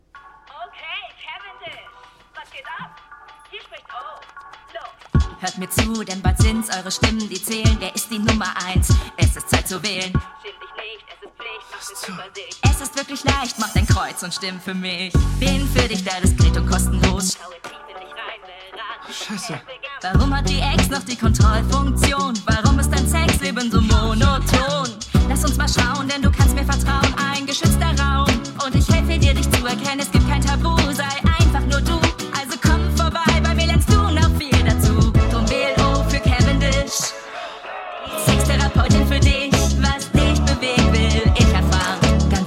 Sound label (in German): Gesang